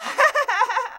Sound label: Laugh